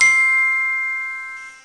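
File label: xylophone short; My Science Fair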